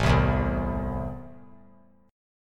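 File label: A Minor, chord strum; Am strummed; JGuitar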